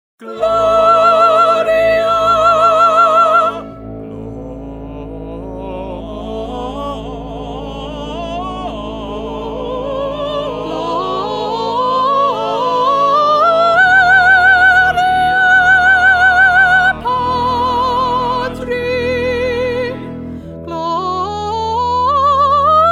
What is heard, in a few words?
First Soprano